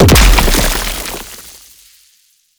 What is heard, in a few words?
Limb Death Dissolve; Enemies; Warframe; ManInTheWall